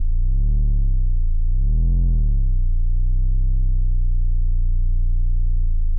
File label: Low Tone, Distorted Reality; Tyrann0s